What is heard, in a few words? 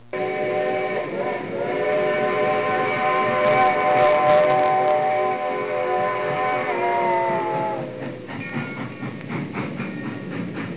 steam